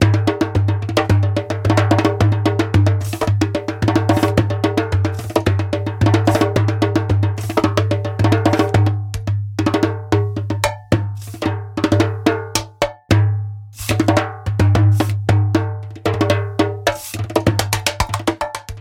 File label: doumbek naked